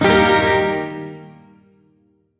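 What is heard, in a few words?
Shawzin Minor Chord; Warframe; Duviri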